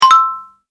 Message Notification; High Tech

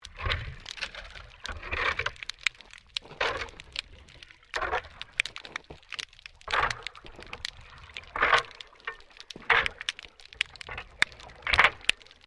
MAINTAINENCE WORK UNDERWATER SHOVEL SCRAPING

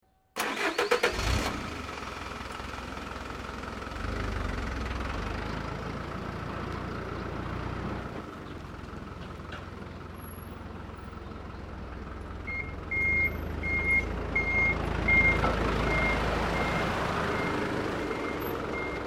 Truck Small Start Backup Drive Away; Generic truck sounds; Vehicles

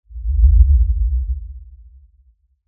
LFE Impact Tremor; Production Element, Imaging Element, Accent, Transition; Low Impact

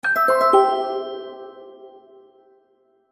Magic Purchase Ding; Cartoon Sound Design

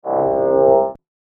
Oh So Heavy Electric Pulse; Laser Gun Sound Effects; electronic sound effect

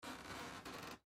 Floor Creak Wood, Floor Creak Wood Board Step; Household; Creaking; Objects

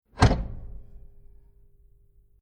Metal Switch Hit Impact Stinger; Tom Hutchings

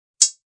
Ting, Metallic; Electronic

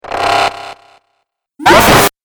Dubstep Scifi Effects

